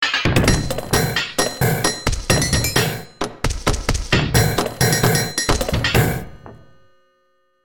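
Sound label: fun factory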